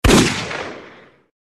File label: Revolver Shot